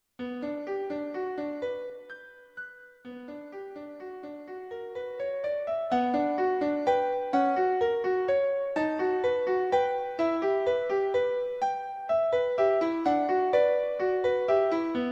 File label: piano